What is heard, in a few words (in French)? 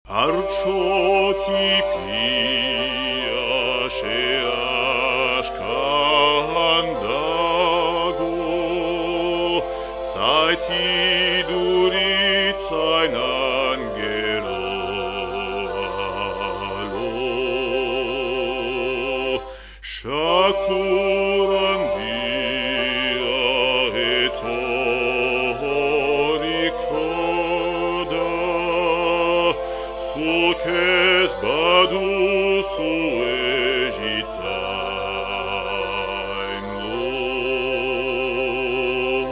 Berceuse basque